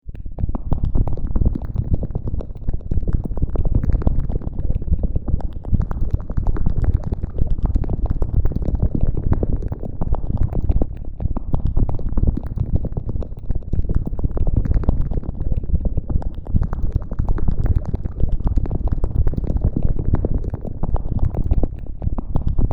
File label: Bubbling boiling thick mud.; Slimy (Sound Effects:Sound Design)